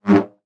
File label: saber whoosh